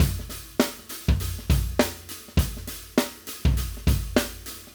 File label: Acoustic Live Drum; Platinum Audiolab